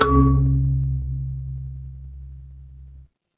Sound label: instrument kalimba